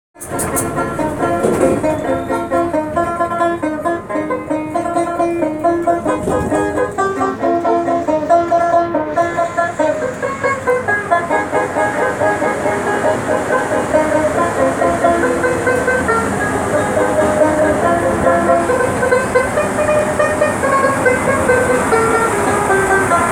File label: Banjo on metro